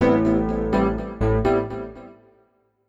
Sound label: SYNTH VOCAL 125 A; Keys